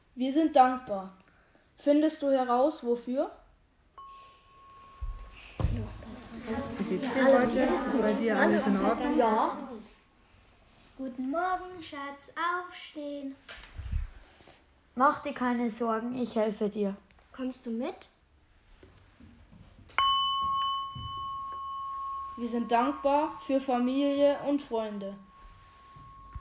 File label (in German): Akkordeon; Hörrätsel Erntedank